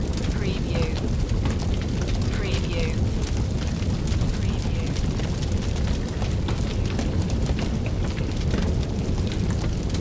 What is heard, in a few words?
Fire Flames Crackle Loop